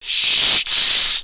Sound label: hiss, animal, pain